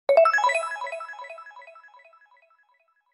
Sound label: Mobile Ringtone